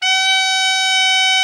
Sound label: ALTO SAX; Best Service; Killer Horns